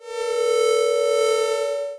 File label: lasercut